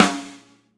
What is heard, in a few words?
Snare Rimshot Hardest, hydrogen drum samples